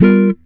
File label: GTR CHORD; Univers Sons; Jazzistic